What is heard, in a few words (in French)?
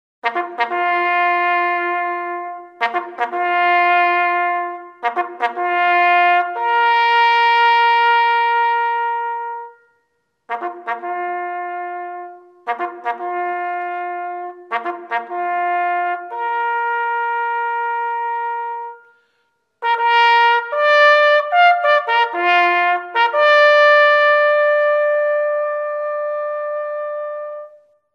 reveil rouse jouee au clairon